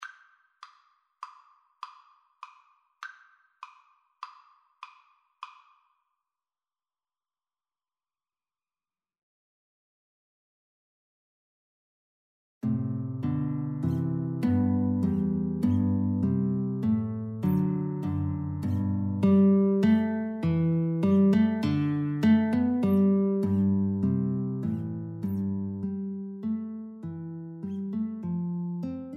mussorgsky, 3 guitar trio

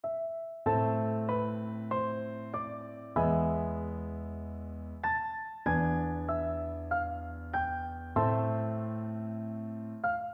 el cant del ocells, piano duet

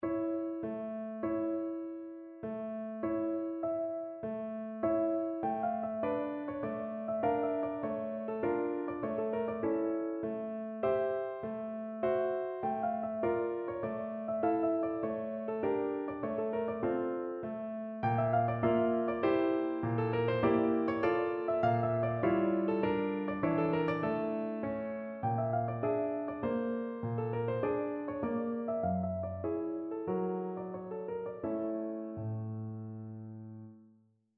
romanian folkdance, piano; bartok

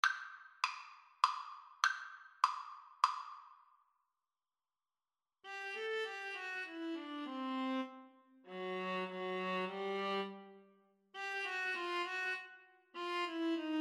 Viola duet

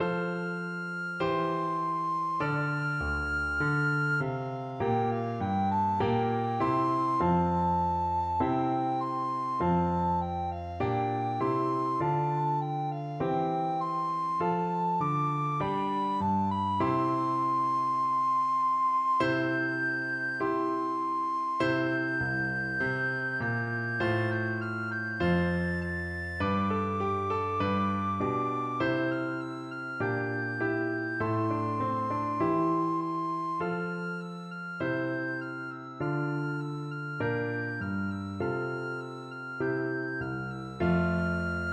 march, recorder

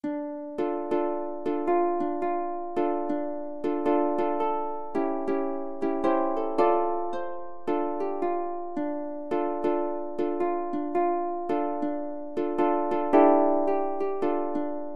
ukulele

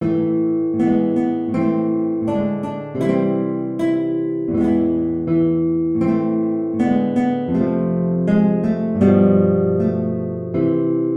guitar

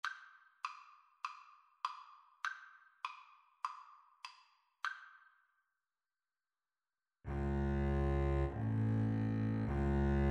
Double Bass Duet

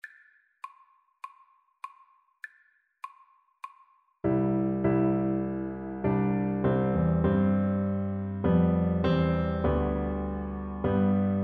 it is well, Alto Recorder

